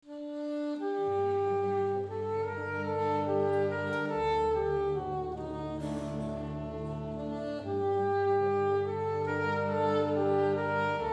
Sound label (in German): Konzert Israeli Folk Ausschnitt XY Stereo Hall; MV Spöck, Konzert 2018, Israelisches Volkslied in X/Y-Stereo, expandiert